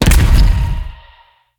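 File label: night monster, impact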